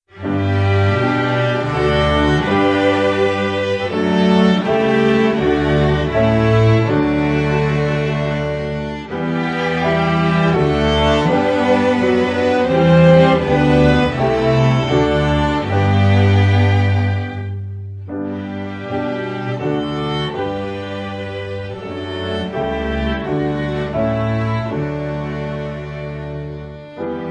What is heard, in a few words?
Chorale